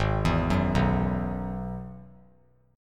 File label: G sharp Minor 7th Sharp 5th; G#m7#5 arpeggiated; 8,11,9,9; JGuitar